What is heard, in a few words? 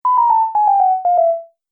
Multimedia Music